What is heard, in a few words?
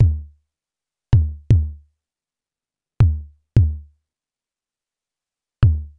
drum loop, ambient, 160; eJay